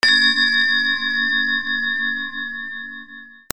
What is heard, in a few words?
BELLS